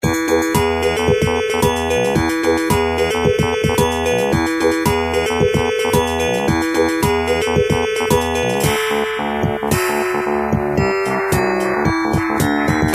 adlib, pc; Turbo Outrun

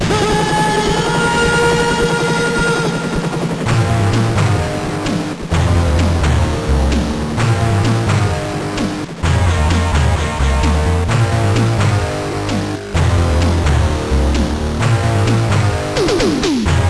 fire, pc

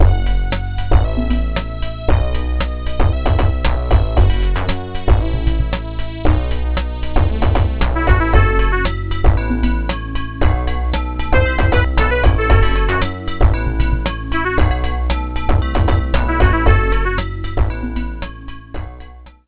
adlib bass

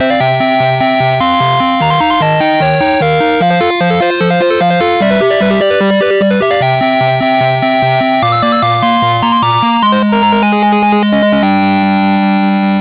tandy, pc; Silpheed

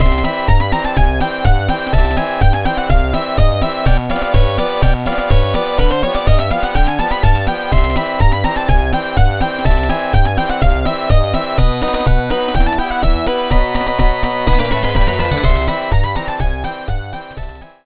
adlib full

